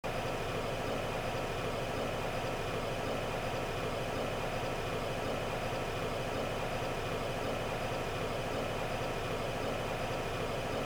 drill idle, weapons; sgdrill

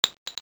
shell, weapons

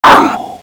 resident hurt; cso

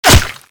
knife hit; thanatos3; weapons

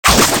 resident skill; cso

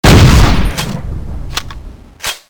oicw grenade shoot

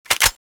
bolt pull, weapons; thanatos3

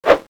slash, weapons

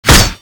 fly shoot, weapons; thanatos3

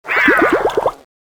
change, weapons; bloodhunter

